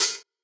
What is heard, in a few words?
Open Hat; Ghosthack Advent Calendar 2017 Day 5